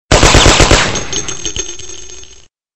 Gun Shoots

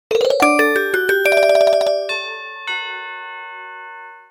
Melody

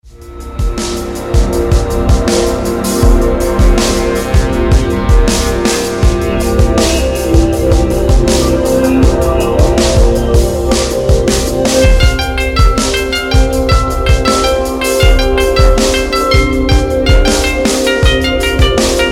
Nice Ambient

